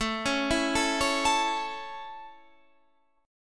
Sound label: A Major A Guitar Half Step Down, chord